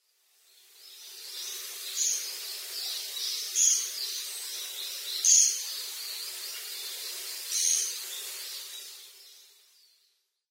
amamikogera, amami, koe; yanagawa

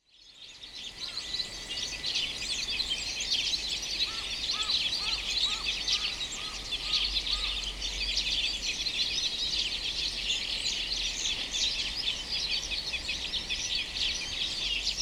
isuka, sapporo, koe

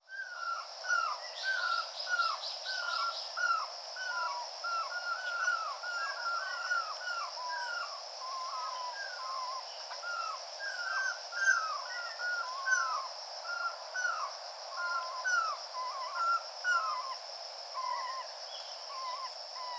akashoubin, Miyakojima, koe; Uemura Shingo

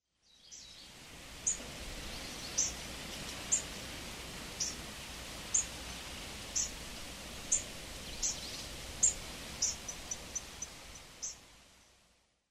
uchiyama, koshima

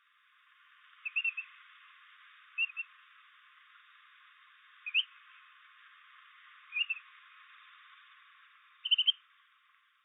sorihashi, hyogo

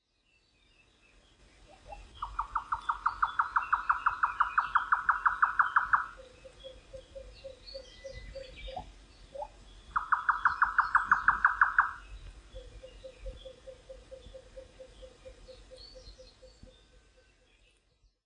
yotaka, wakayama

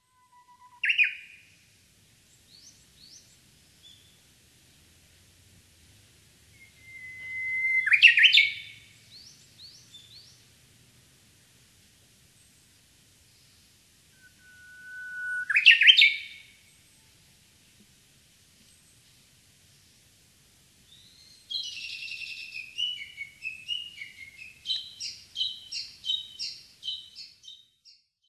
uguisu, kokubunji

